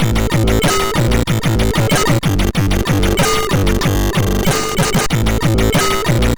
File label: Test, sid MOS6581R4